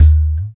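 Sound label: TLK DRM, talking drum; East West - Phat & Phunky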